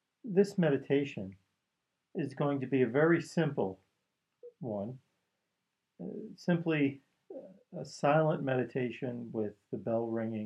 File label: silence with bells; guided meditation recordings